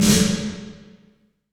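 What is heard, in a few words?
snare drum ROOM; SD; SPX900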